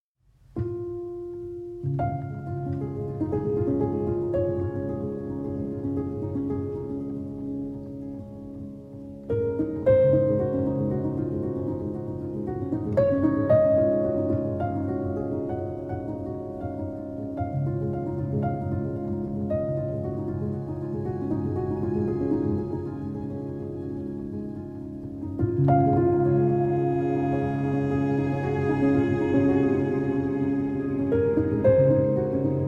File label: Prelude in D-Flat Major for Piano, Violin, Cello; Op. 28, No. 15